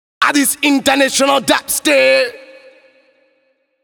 International Dubstep; Сэмпл вокала (Дабстеп); 140 BPM; loop; Vocal; мужской

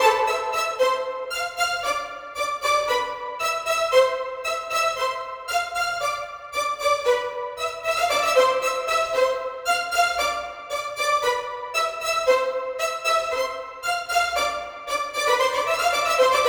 violin; скрипка звук для средневековой драма

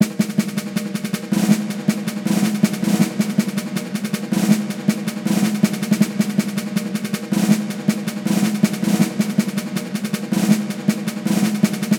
Snare Rolls

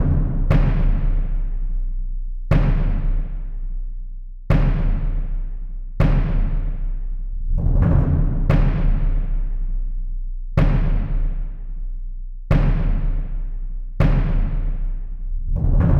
Bass Drum

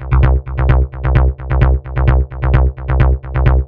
Dancing Bass, бас сэмпл электро джаз; Electric Jazz; 130 BPM; loop

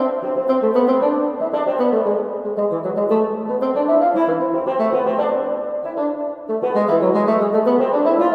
bassoon; драма

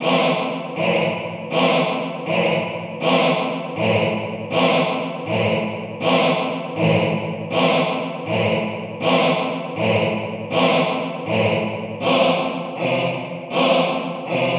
Male Choir

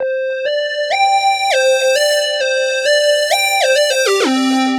Lead; Rock & Pop

